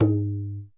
TABLA L, SY99 KIT; Crypton - DrumBase5000

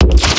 vocodex

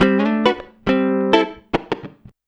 104 FUNKY, Electric & Acoustic Guitar Loops

